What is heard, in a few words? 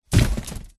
cardboard box set down hard on gritty hard surface; zapsplat